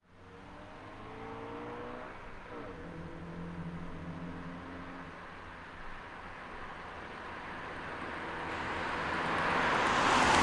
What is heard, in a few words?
honda civic 2001 by wet 50 kph; intrepid; Sonniss GDC